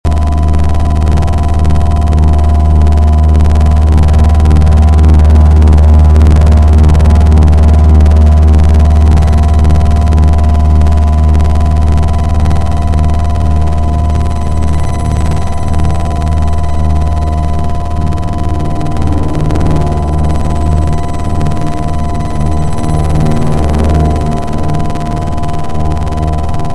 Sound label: phase shift stutter